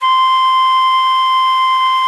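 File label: C5 flute, pipe; AKAI S-Series CD-ROM Sound Library VOL-8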